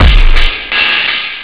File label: drum loop; awe32